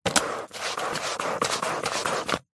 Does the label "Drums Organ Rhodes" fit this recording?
No